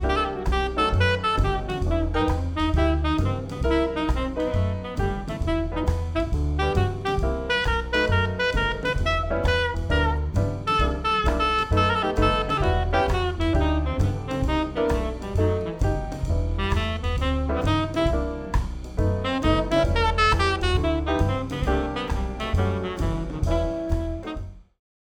Spill Enhancement Full Mix; Recording Mistakes

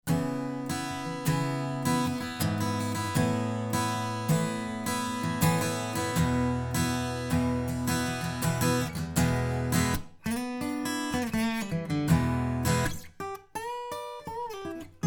Ac Gtr

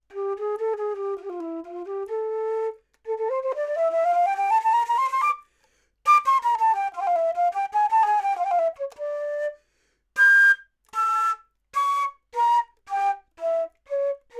Flute, 40cm Low Mid

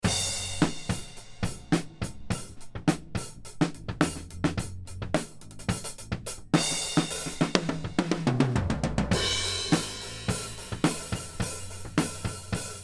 Dual Array Facets Drums Overheads XY

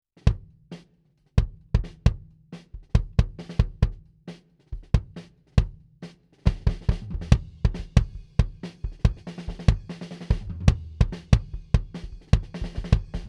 Kick, Distance Tunnel D112 Inside

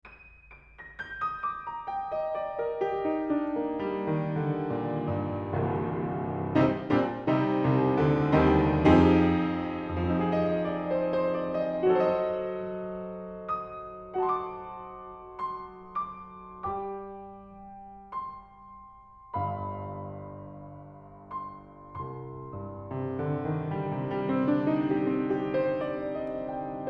Piano, Distance 185cm Mic

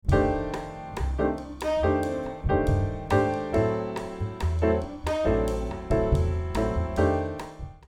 Piano Meld Pan

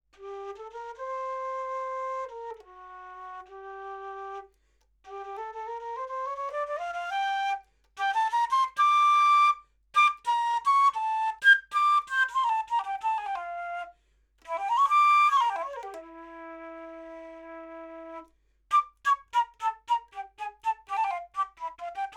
Flute, 30cm R

